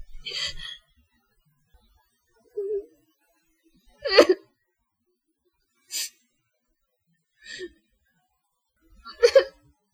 crying

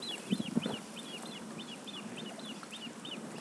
House Sparrow, Passer domesticus; Varpunen; Aves; iSoundNat

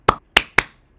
clap

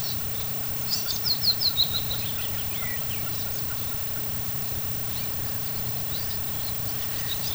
Rose-breasted Grosbeak, Pheucticus ludovicianus; Aves; iSoundNat

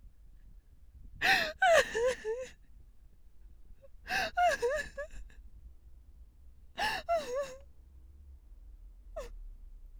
crying

